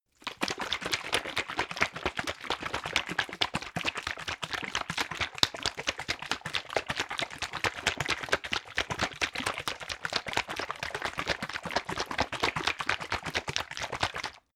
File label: bottle syrup shake long; berufe; gastronomie